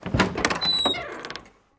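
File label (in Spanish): ABRIR PUERTA, open door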